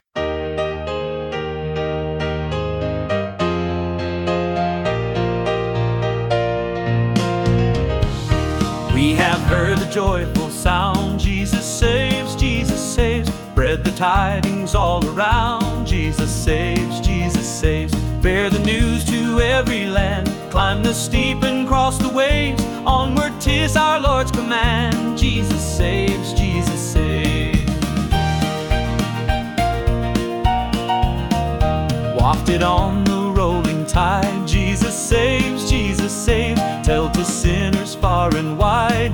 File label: Hymn, Gospel, Piano, Upbeat; Jesus Saves!